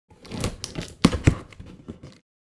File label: Cardboard box opening